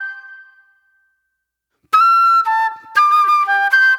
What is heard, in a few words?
Soul Plate Flute